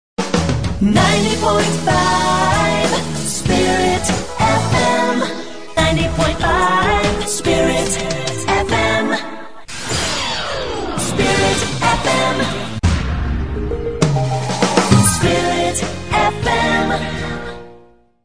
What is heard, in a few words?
jingle; WBVM; Spirit FM 90.5; 2006